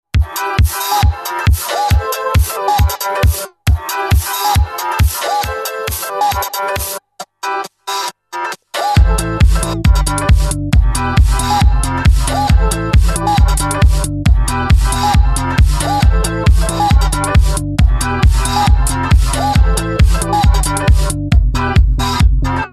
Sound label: instrumental